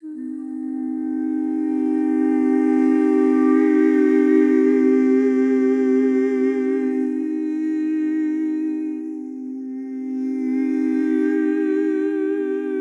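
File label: Dynamics Ethereal, sustaining a C minor chord in two octaves; vocal samples; Void & Vista; Kontakt; Foundations/Dynamics/DYN 08 Ethereal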